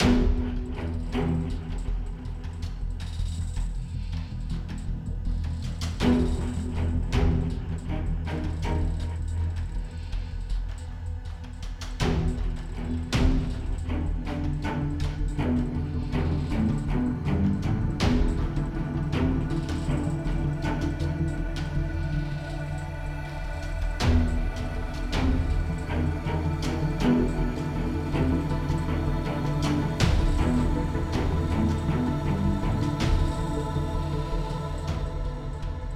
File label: Threatcon Delta Ambient; modern combat 4 zero hour; android